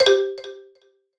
PUZZLE Success Xylophone Two Note Decline Bright Delay; stereo; Universal Sound FX